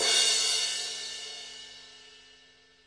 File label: real life CRASH, Real Life Kit; REAL LIVE KITS; Another Real Life Kit